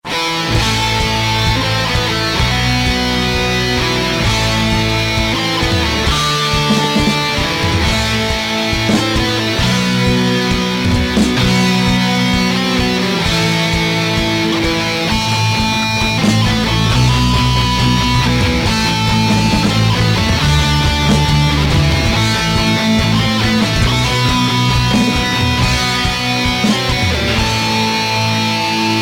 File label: Rock; Age Of Empires